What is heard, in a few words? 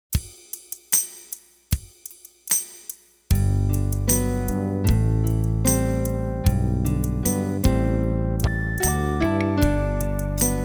Improvisation In G Major Slow